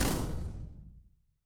retro game explosion

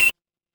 retro game coin

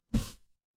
cartoon poof

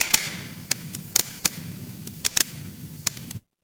fire electric crackle

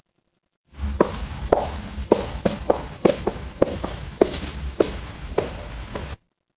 footsteps heels

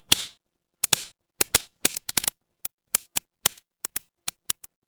fire electric crackle

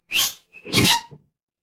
cartoon squeak

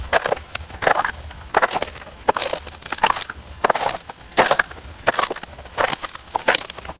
footsteps gravel

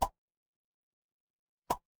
cartoon pop

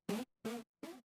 retro game jump

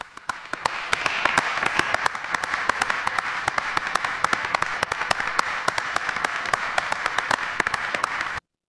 crowd applause

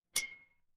glass clink

